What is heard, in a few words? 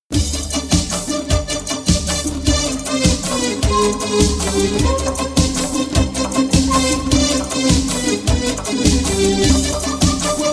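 Violin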